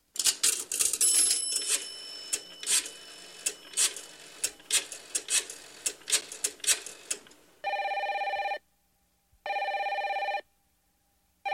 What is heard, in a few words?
dial a phone number